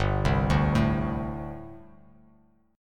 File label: G Suspended 2nd Suspended 4th; Gsus2sus4 arpeggiated; JGuitar